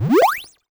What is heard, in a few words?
Jump